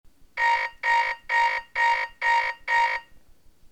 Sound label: alarm high alert